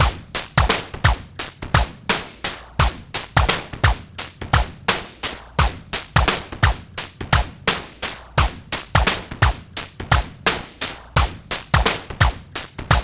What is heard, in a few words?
86 bpm; TRIBE VIBE; 90 s Sample CDs; Planet of the Breaks